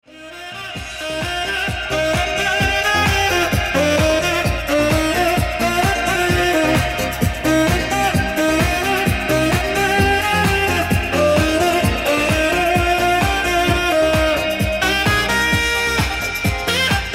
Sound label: Saxophone Cover